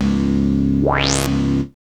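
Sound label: synth sweep; E-Lab; X Static Goldmine 1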